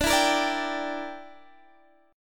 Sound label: E Minor 7th Flat 5th D